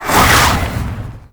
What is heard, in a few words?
Baby Dragon Split Shot Cast C; Warframe; Duviri; Duviri Void Dragon